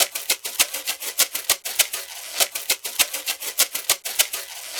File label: HouseHold Shaker (100BPM); Loops